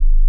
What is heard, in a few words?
A flat 2, pure tones